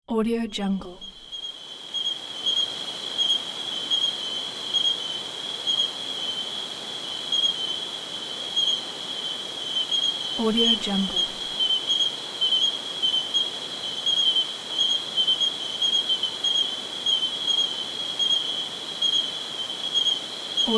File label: night in mountains